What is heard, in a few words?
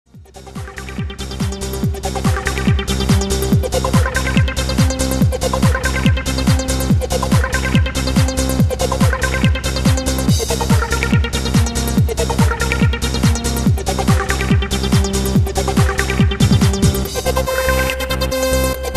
trance